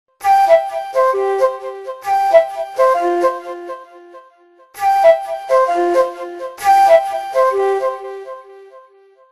Alarm, Nokia Lumia 1520; Lumia 1520 South Africa

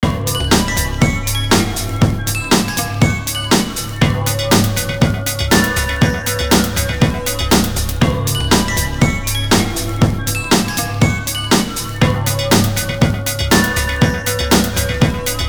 RainForest; Nokia; PlusFourSix; Lars Edvall

